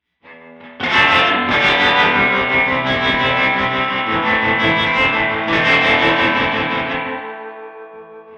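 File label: Spring Electric Guitar Guitar Flange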